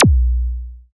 FX A, ANALOG; Sound & Vision - Gigapack II

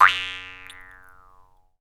JEWS HARP, Earth Tones; Jew's Harp & Spoons

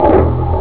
elec gt soft; jazz jackrabbit 2

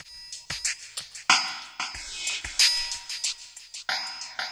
7-8 CHUGGI, 60-110 BPM; Spectrasonic Distorted Reality 1

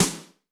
Rock L, Yamaha DTXpress-II snare

